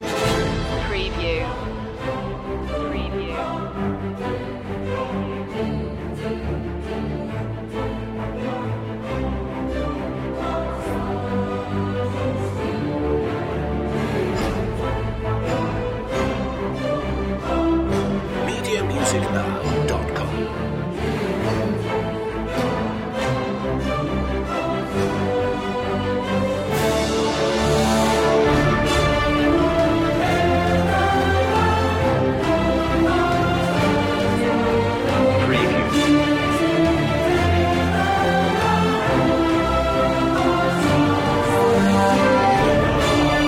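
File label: no percussion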